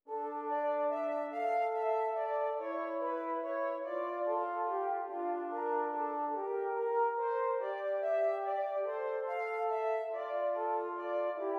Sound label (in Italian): Esercizi di contrappunto a due voci; Zweite Gattung; Nottebohm pagina 49; corretto